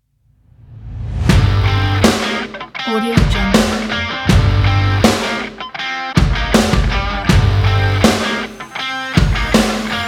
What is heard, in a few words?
sport rock action trailer